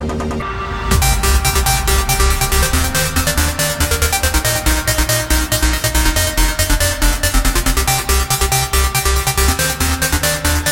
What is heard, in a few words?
Trance Mix Aria